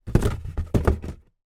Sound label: foley cardboard box movement shelf; zapsplat